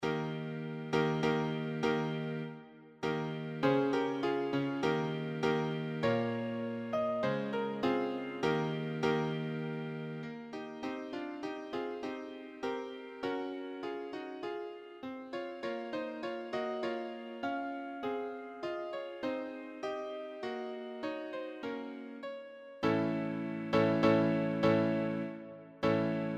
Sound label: piano